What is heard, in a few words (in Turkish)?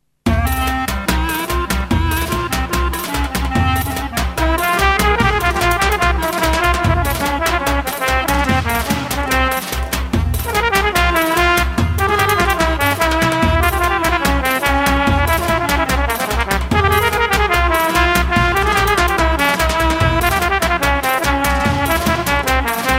ciftetelli trakya